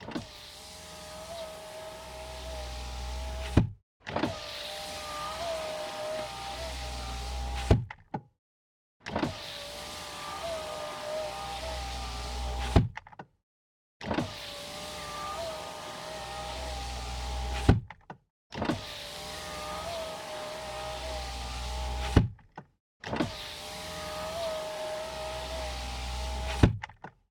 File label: Chevrolet Cruze Window Closing